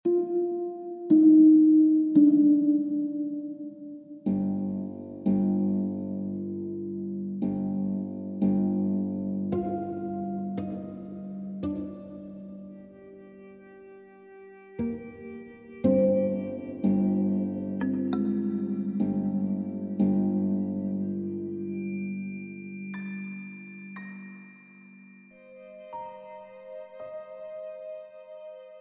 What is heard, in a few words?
orchestral mix